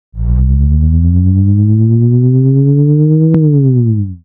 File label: engine rev